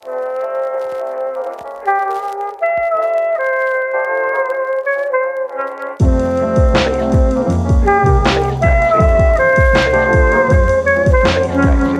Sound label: Chill